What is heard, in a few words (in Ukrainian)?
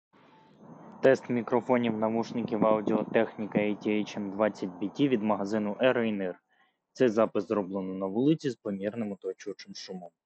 на вулиці; Audio-Technica ATH-M20xBT